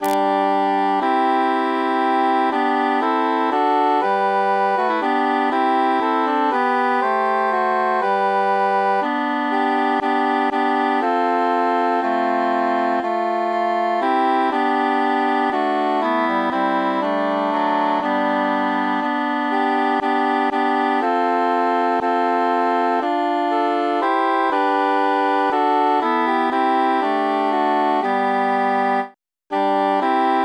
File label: transposed bassus mute